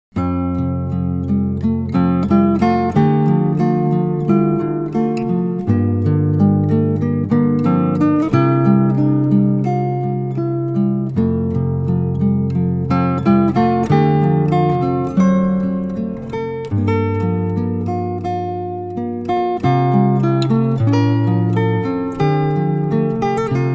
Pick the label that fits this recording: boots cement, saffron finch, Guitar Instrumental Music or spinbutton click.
Guitar Instrumental Music